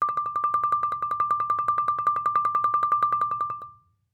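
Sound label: Marimba roll fortissimo D6; MIS Pitches - 2014; Percussion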